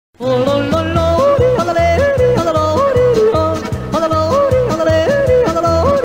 jodeln

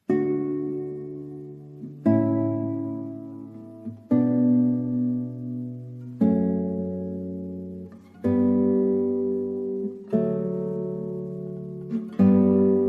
gezupfte Gitarrenklänge

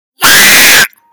entspannte musik

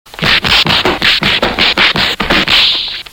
schlaege

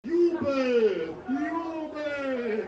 jubel

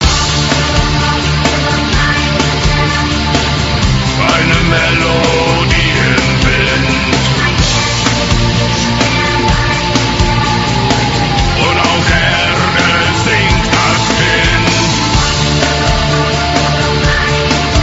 spieluhr

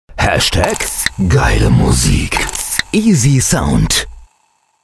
geile musik jingle

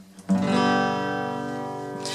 starker Gitarrenakkord

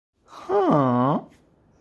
eule

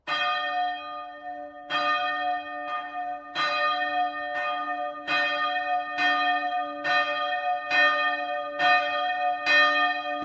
feuerglocke bimmelt

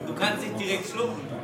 schlucken

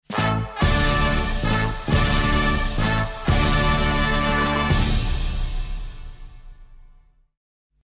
tusch karneval

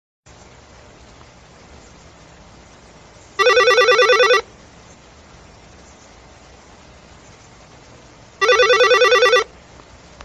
klingeln

